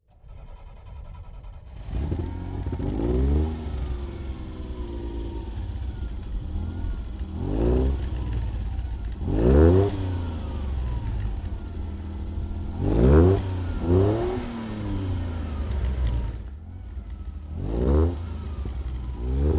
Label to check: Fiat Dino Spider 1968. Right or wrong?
Right